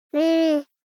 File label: BABIES TALK Boy 12 months Mumble Wuhwuh; Baby Boom